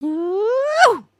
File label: breakdance woot up, emotes